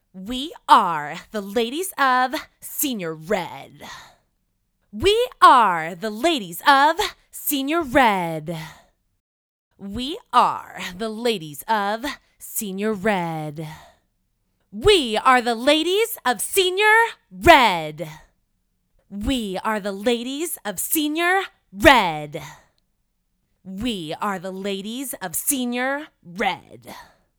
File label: we are the ladies raw vocals